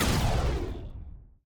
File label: missile plasma; Mindustry